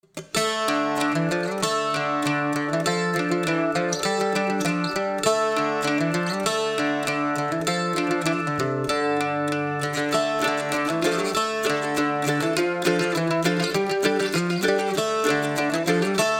Medieval Walk; Drizzlepath

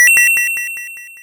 Cash

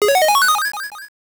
1-Up (8-Bit Soundtrack)

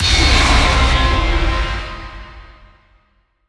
End Stinger; Void Bastards

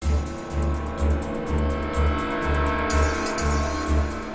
Low Music; Alien Breed Trilogy; PS3; Xbox 360

